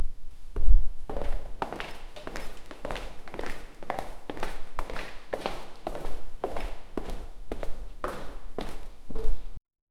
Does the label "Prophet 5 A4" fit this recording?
No